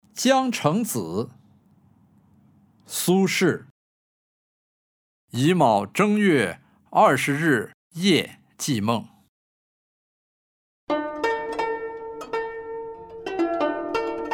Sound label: music; jiang cheng zi